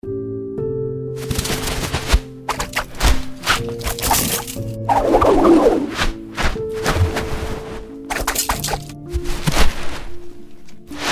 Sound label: whoosh